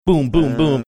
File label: boom boom boom